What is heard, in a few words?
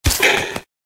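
Lizard Wound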